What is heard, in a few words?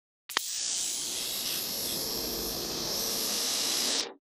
projector whirs and click; historic